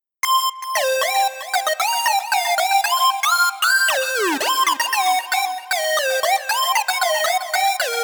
bendy lead, There's two different patches layered on top of each other, which contributes to the slightly-detuned sound.; bending/portamento so it's really bendy; Rhythm Quest; synth; Later on in the chorus I try to introduce some thicker synth arp patterns.